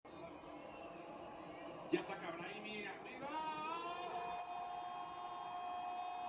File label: laser